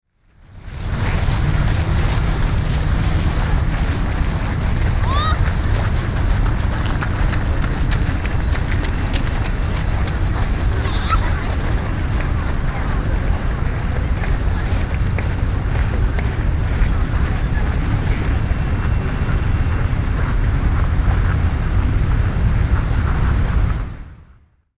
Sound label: palace, tokyo